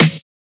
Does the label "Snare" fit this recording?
Yes